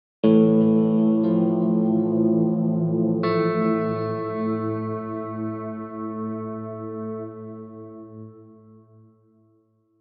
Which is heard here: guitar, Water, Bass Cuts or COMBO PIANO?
guitar